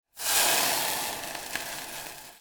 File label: steam hiss; hyperrogue